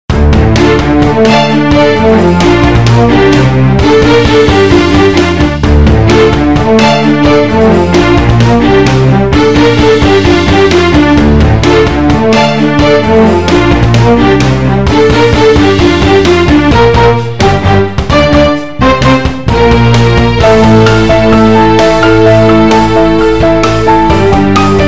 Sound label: instrumental solo 441 Hz